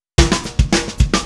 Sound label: Drums Intro, Indie Rock; Sonic Foundry (Sony Creative Software); Indie Rock Anthems; Session 01 - Brighton